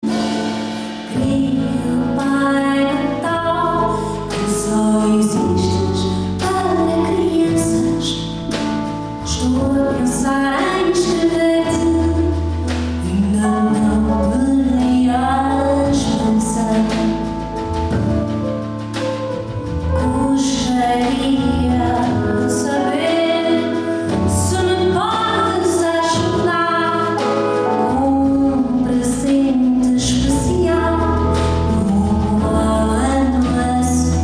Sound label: jazz; Covilhã